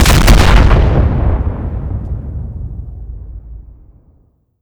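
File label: rocket explosion; gmod; viper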